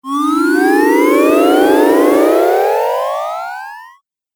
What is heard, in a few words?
Magic Fx Video Game